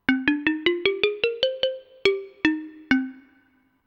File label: xylophone short